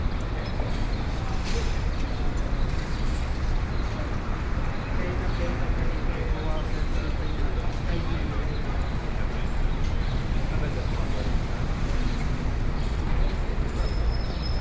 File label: marketplace ambient; gmod